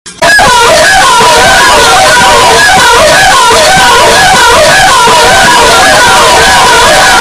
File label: ohh